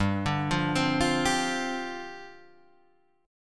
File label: G 7th Suspended 4th G down Guitar Half Step Down